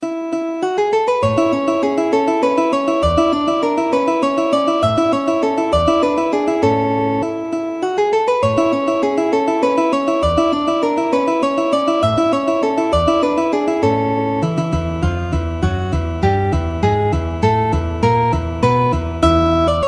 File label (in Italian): valses, guitar